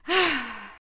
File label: Woman Sigh